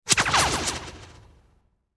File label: Laser Zaps Fast By